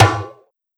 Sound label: Perc Pan, Misc Percussion; Drums